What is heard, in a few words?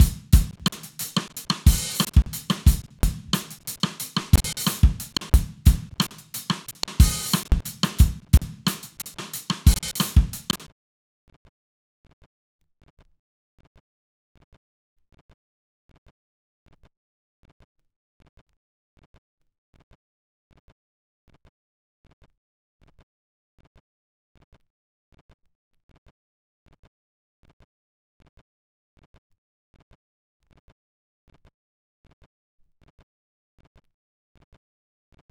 drum loop groove 90